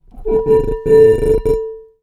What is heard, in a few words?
Finger Rub, Large Wine Glass